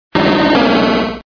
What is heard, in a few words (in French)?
Cri